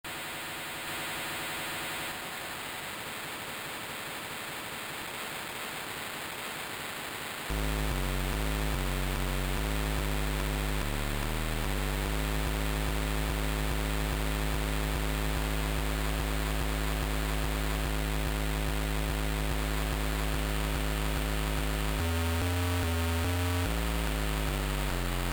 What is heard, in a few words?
1bit noise; quantumoscillation24; oldskul noiz; scene